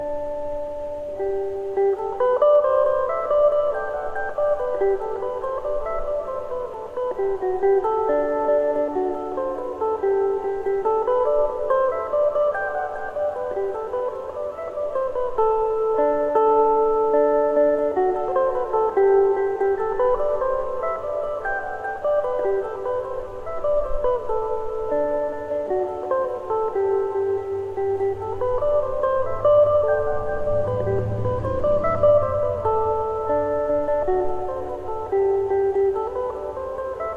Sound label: mando